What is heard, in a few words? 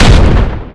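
explosion metal armor vehicle close; Explosions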